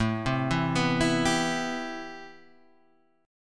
A-Minor 9th A; Am9 Chord {5 3 2 4 5 3} arpeggiated

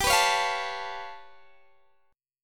G 9th Flat 5th, Guitar Open D

